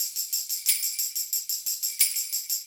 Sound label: 90 TAMB